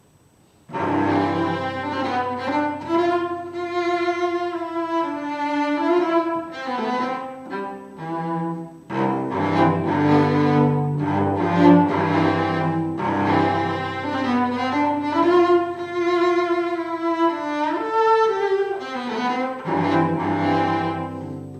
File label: cello Sound Sample